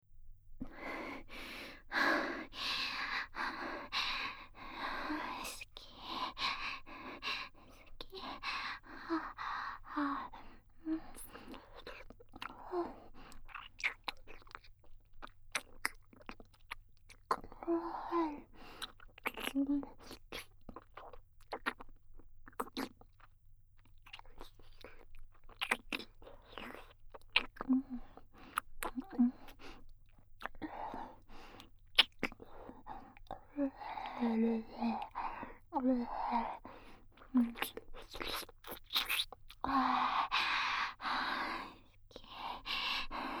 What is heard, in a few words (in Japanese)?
好き好き連呼＆密着ディープキスセックスループトラック, ASMR; 異世界現地妻なダークエルフさんとの濃密おまんこ交流